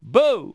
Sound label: boo, bloopers; Wired for Sound Pro CD; Aristosoft, Inc.